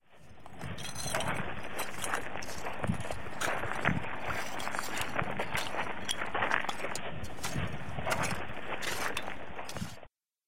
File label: lagoon stones